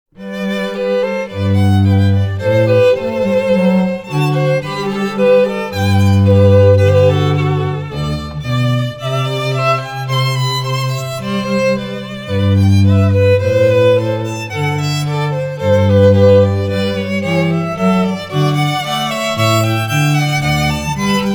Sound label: Andante, classical string quartet